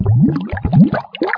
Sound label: air slow; Deep Fighter